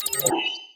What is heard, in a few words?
start research, ui; Stellaris